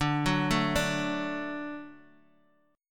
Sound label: D Major D down; D arpeggiated; JGuitar